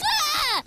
tiny rage; markiplier

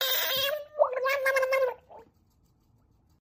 frog

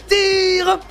tire

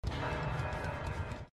hook sound; dbd

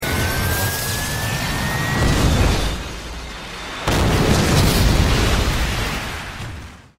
charged particle cannon

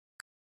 save click; pinterest

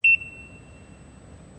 scanner keyence

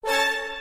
ui one note

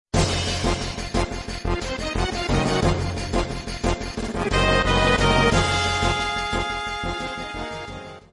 battle start; ff7

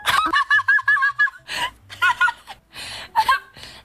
laugh

